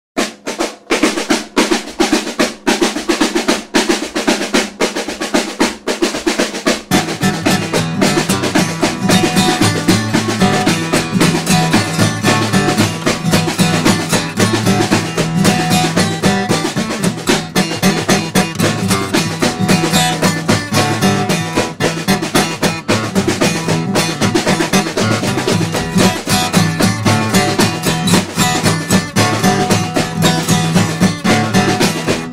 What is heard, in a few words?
Instrumental